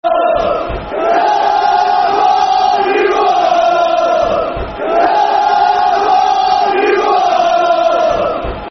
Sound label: Football Chants (Soccer Songs); Come On You Hull; Hull City Hcfc